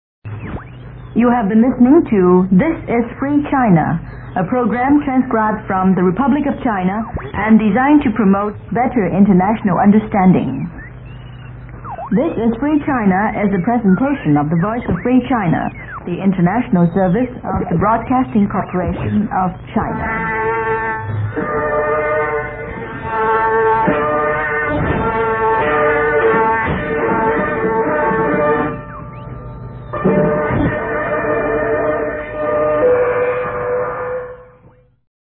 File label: interval signal; vofc